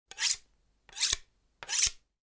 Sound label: sharpening knife